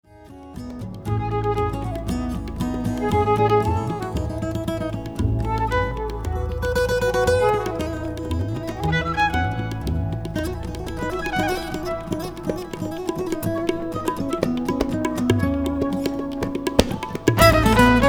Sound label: Lydian Mode